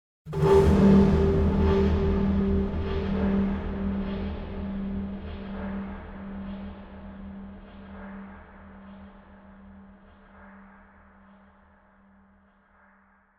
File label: Impact Long Echo Wooden Industrial Hit; Hollywood Edge; sound designer's toolkit v2